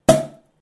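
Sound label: Champagne Cork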